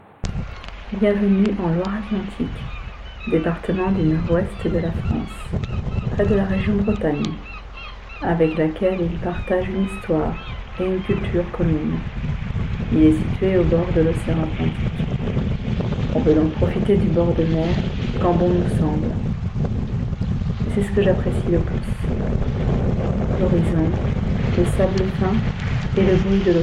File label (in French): carte postale Loire Atlantique